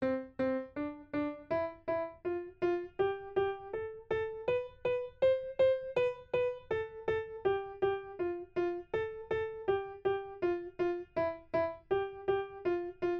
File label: piano